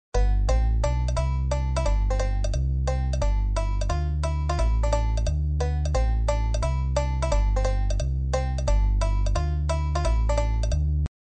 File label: Wind Pin; gamerip; make a hat for elmo; online windows